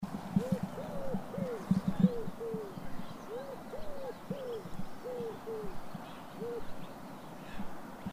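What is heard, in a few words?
wood pigeon voice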